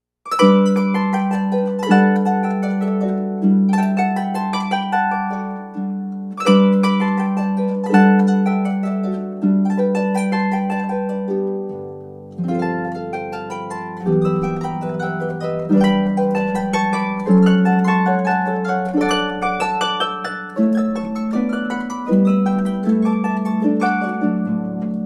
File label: Tempo di Bourree, harp; Salzedos Variations on a theme in the ancient style